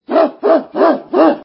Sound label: bark bark bark